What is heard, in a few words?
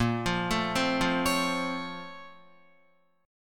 Bb Minor Major 9th, Guitar; arpeggiated; chordshape; 5,8,9,5,5,9; JGuitar